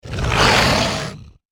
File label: attack; darkwraith